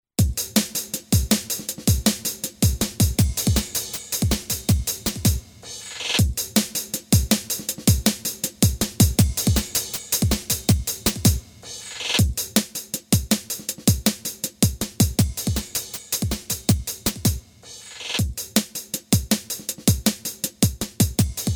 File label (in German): TransReckon Klangbeispiel